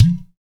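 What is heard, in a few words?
percussion udu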